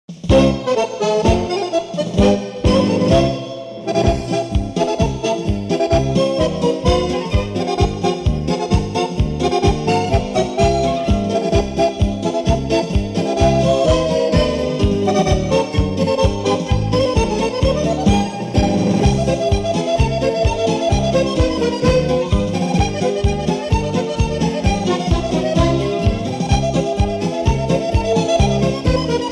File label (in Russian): Polki instrumentalnye, Berlinskaya polka